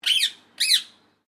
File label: Bird Parrot Indian Ringneck Chirp Sequence; Ringneck Parrot in Horsham Victoria; Birds; Animals